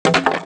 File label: wooden planks on bricks, clattering